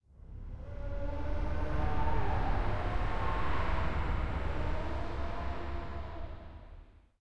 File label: corridor, undeadtower